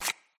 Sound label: card slide